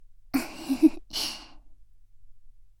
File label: laugh, ASMR; Garden; WithRin-Nazo01